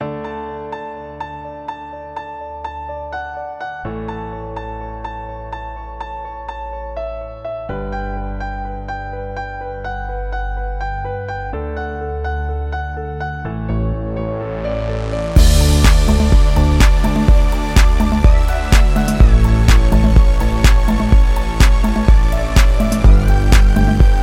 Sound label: music ringtone; Jolla